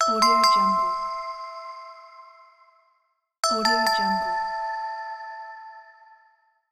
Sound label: light bulb